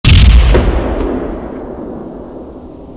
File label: blow